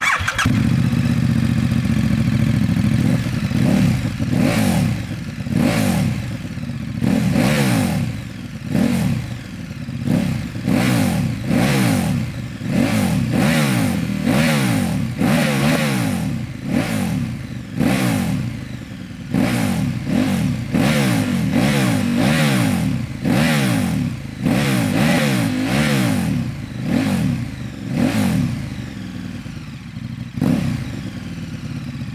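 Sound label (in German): GPX2 ohne dB-Killer, Auspuff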